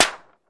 Target Hit Light Pap, target range

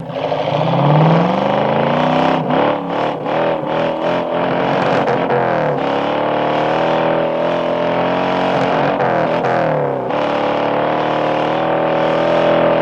Mustang Revs Out Dbl C

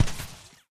flak canon impact, futuristic

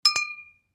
Bottles Clinking; eating & drinking

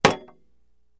Axe Hits Shield 02 Lig

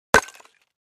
Clay Chimney Vent Hit, concrete & brick

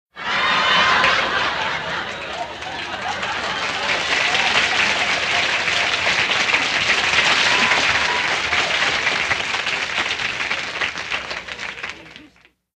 Crowd Applause Laugh; medium

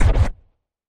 Music Record Scratch, record players; Music Effect; Lowered Record Scratch Sound.

